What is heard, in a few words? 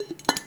Candle Insert Metal Holder; SFX Kit; Foley - Misc